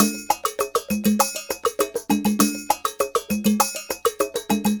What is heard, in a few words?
Ensemble, Latin Percussion